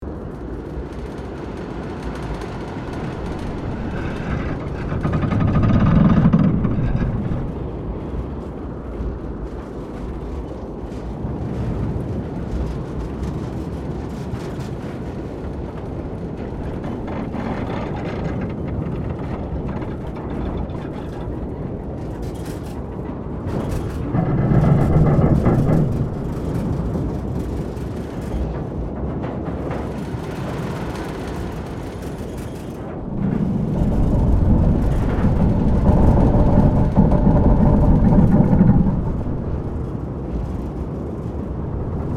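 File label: Ambience Spaceship Hall; Dead Space 3; PS3, Windows, Xbox 360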